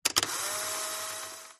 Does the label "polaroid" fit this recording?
Yes